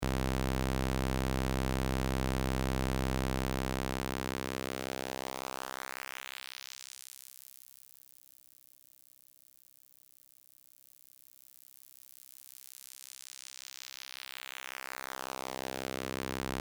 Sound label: Highpass